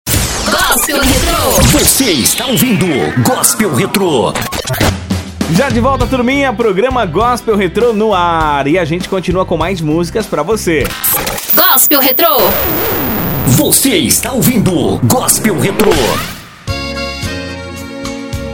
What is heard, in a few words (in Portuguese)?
Gospel Retro